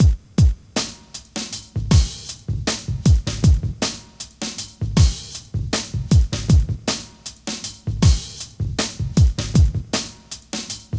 GRV, Guit It On

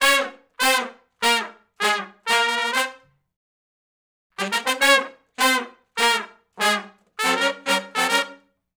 Funk Riff (Eb) unison, har, Horncraft for R&B; Sony Creative Software; Crimson Blue and Fabulous Horncraft 4 RnB

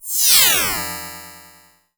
META GLYDER, SF EFFECTS; Q Up Arts; Dream Experience

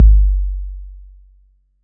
BD 808

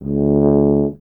BRS TUBA, Solo Instruments

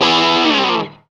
GTR Crunch, Dirty; Club-50 - Foundations Roland

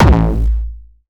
Jump kick, Jump hardstyle kick

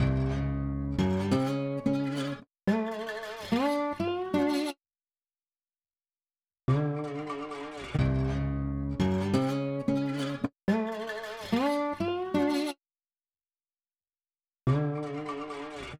Odd Time Melody; Sonic Foundry (Sony Creative Software); Westside Underground

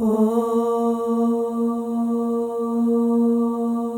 OH, FEM, Symphony of Voices

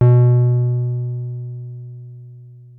303 A3, TB 303

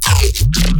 glitch stab; Virtual Riot; Glitch Stabs

